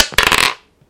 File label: dice roll